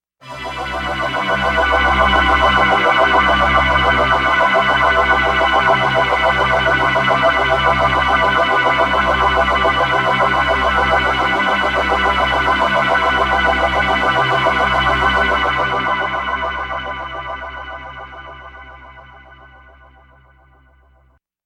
poly pad pigtronix

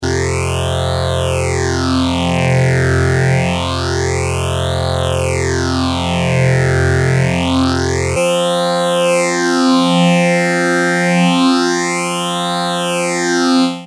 prodigy phase 100

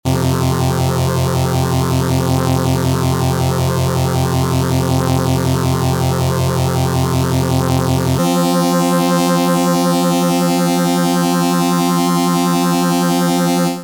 master phaser

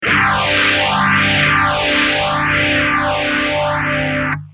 gtr

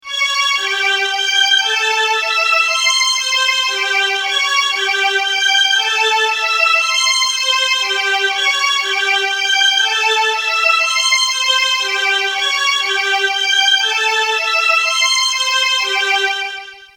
strings, phaser; x5dr; systech phaser2

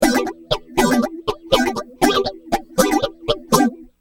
rhy gtr sph323

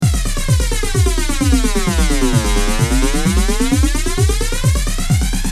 drum loop

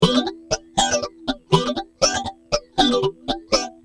rhy gtr ph350

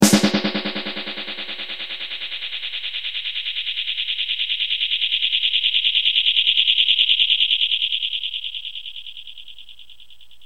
DM100

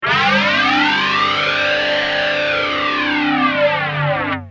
gtr ibanez fl99

